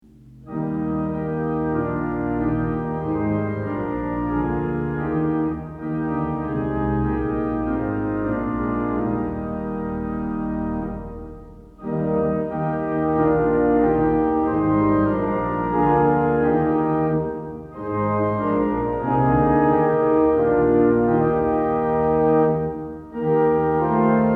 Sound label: Organ Music for Worship Services; At Thy Command I Leave My All; Sixteen Liturgy Hymns